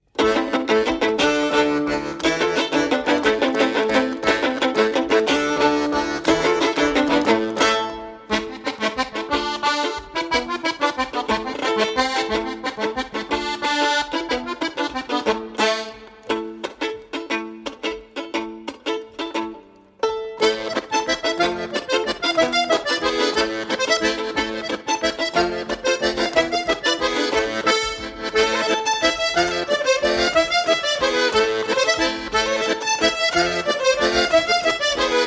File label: mazurka